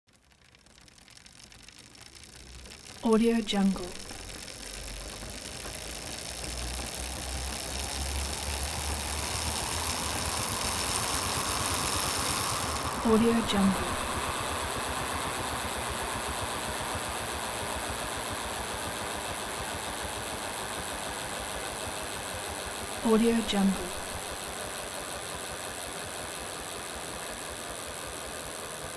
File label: transportation bicycle street accelerate high coast